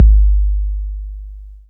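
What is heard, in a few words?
bass drum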